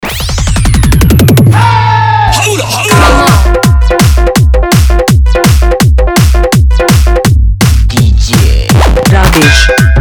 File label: Blasting Music, Dholki Bass; 15 August